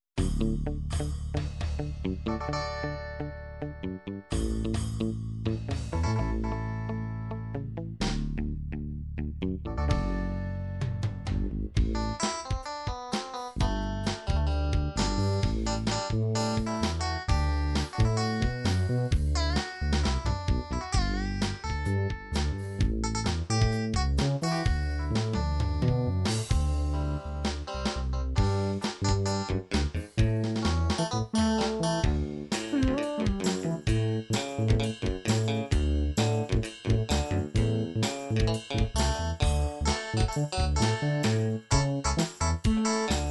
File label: guitar mute